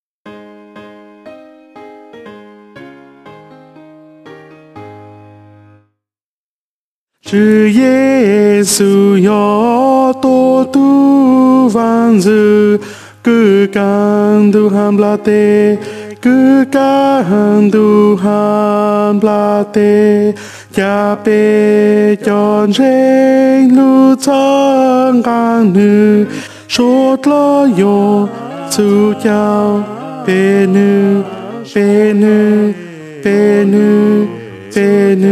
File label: tenor